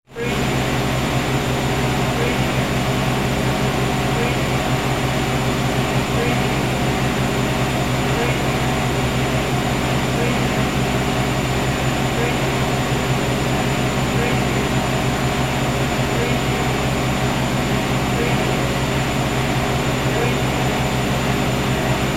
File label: washing machine